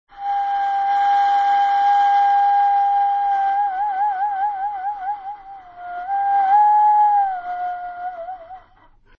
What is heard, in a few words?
koauau